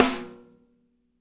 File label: SNR; Spectrasonics; Burning Grooves